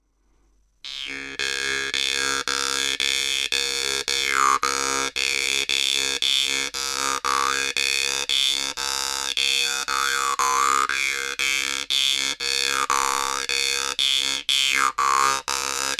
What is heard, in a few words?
melody jews harp arrow